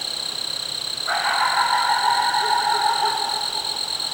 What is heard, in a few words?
coyote call; MAMMALS